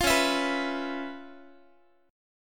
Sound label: C sharp 7th Sharp 9th Guitar, chord; C#7#9; strummed; tuning=4A,4E,5A,5D,5G#,6C#